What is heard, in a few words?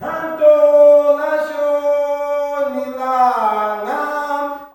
MALE CALL; Spectrasonics; Heart of Africa 2